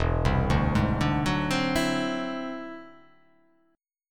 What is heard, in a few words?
E Augmented 9th E down; E+9 arpeggiated; JGuitar